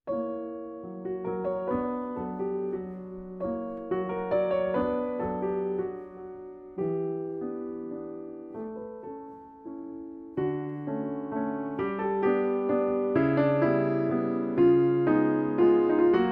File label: Waltz; Viktor Kosenko; Celebrate Theory recordings